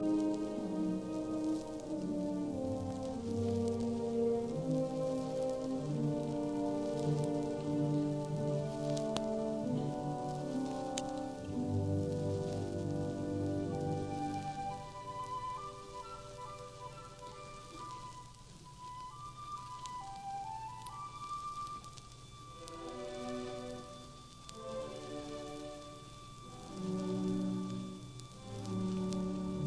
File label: Symphony in G major Allegro con brio; Dvorak; Philharmonic Symphony Orchestra